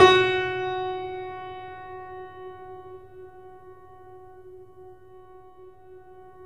Piano, YAMA SUSTAIN; InVision Interactive; The Piano

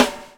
BUBBLE; Spectrasonics - Backbeat

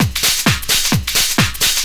drum 130 techno; Techno Trance; DRUMLOOPS; Techno Trance Essentials

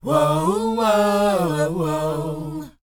WHOA D#, Vocal R&B & Dance; ILIO; Vocal Planet

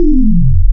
Descending Even, wavetables; Animoog

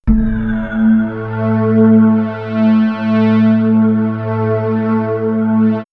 Swirls Drone Stack, pads; X-Static Goldmine

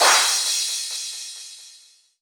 Crash, Cymbals

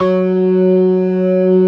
ORGAN, C3 PERC; Keith Emerson Signature Series

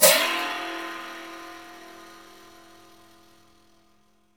GUIRO

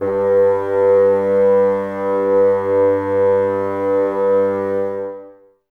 Bassoons G 2; Wood Winds; Miroslav Vitous; Woodwind Brass Ensemble

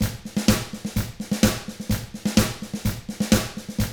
127 Drums

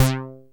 TEISCO, BASSES

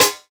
Snare, jungle breaks drum sounds

